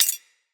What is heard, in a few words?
Point Drop; Greed; Pickups